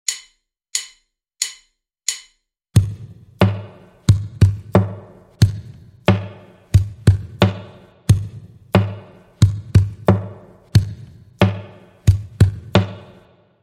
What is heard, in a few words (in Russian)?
Perkussiya